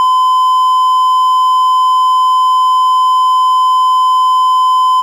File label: Beep Steady Long